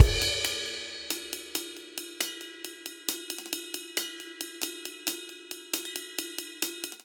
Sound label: Motorik Breakdown 136 bpm, Drum; 4 4; MIDI Clips; Ableton; Factory Packs; Drum Booth